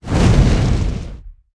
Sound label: skill explo